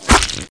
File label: gory impact; SINDEMO